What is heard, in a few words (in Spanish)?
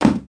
Obj Peque Cayendo